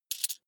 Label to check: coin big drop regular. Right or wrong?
Right